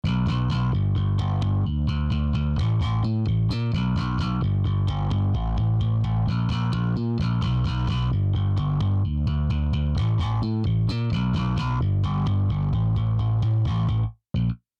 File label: Slap